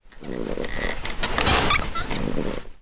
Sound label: household person movement bum seat